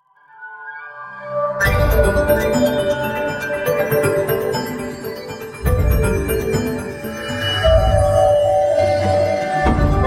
Open Soul US

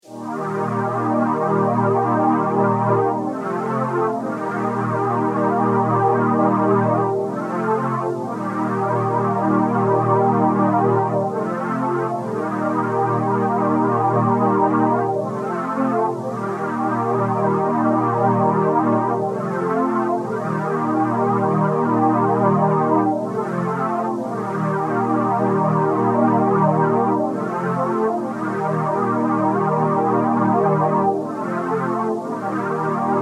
Pad Juno Chorus 120 C; Duster Pad

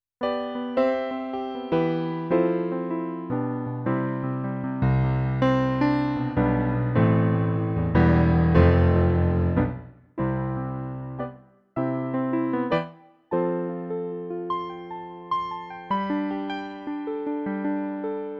CLP470 Concert Grand

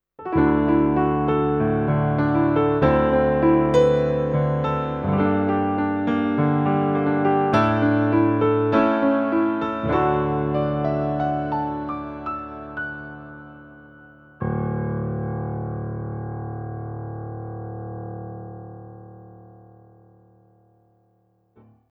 Montage CFX Preset

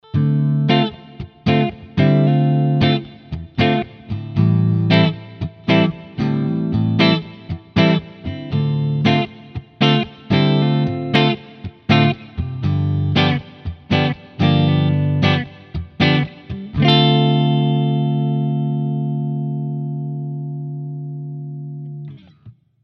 Epiphone Les Paul 50s Neck Bridge Clean

